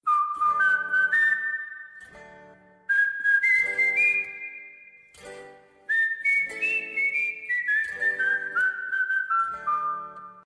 whistle; Matilda

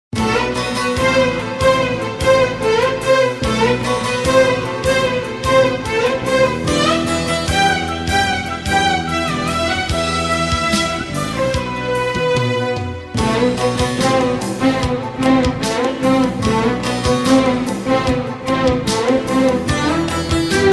keman classical

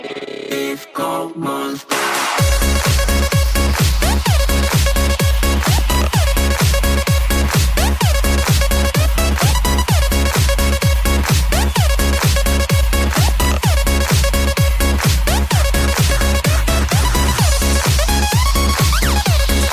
disco

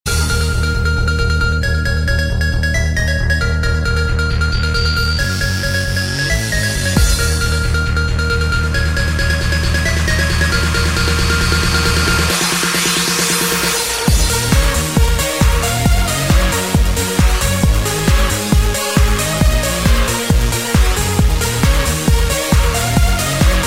trance

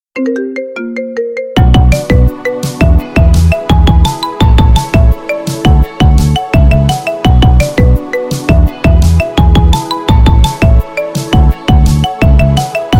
marimba remix

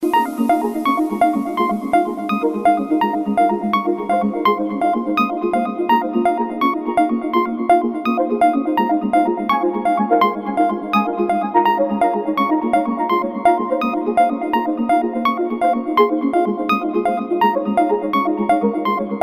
moto perpetuo electro

